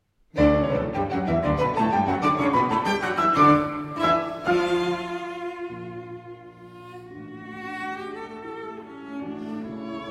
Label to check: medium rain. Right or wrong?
Wrong